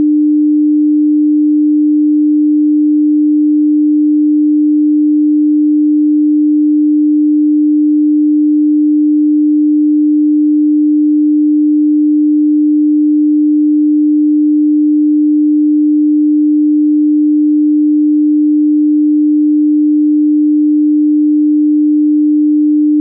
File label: sine 300